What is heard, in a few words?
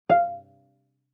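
Cello pizz fortissimo sul A F5; MIS Pitches - 2014; Strings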